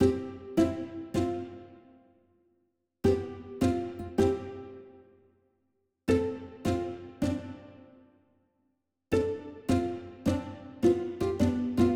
uplifting satisfy

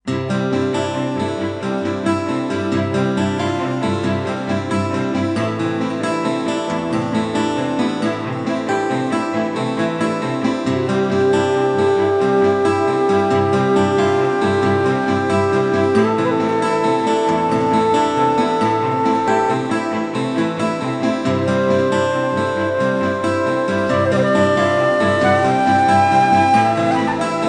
acoustic

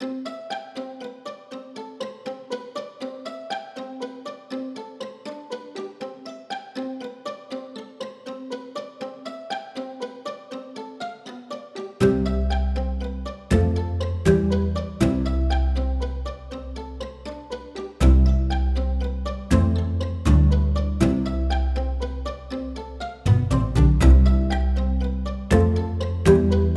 uplifting spring

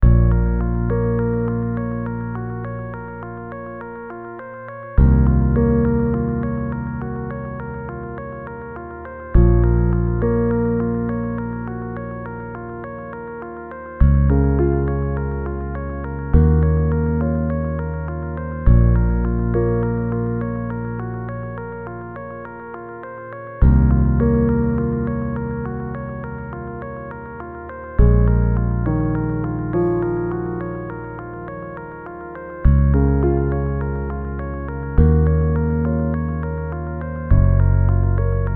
ambient

